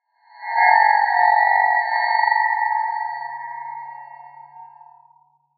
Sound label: Transporter Beam